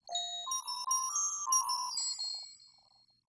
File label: Glockenspiel